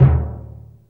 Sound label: TIMPANI LO